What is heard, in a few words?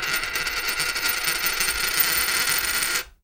spinning coin